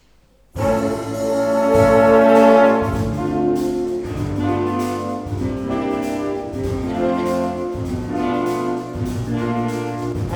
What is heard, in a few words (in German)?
Bigband